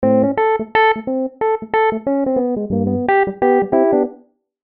90 A Rhodes

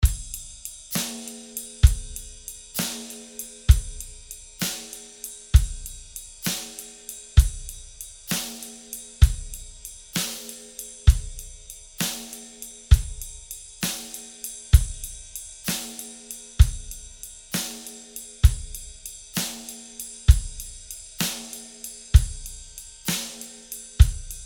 65 Bop Full Drums

